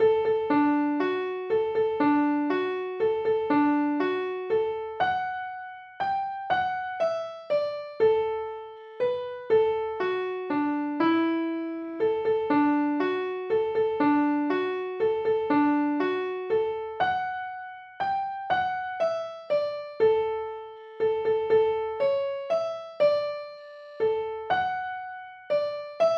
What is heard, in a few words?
waltz; cumberland